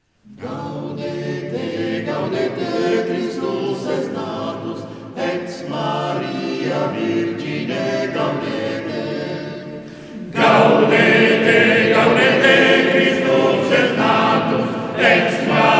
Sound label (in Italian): Coro, Gaudete; CORO DELLA POLIZIA TICINESE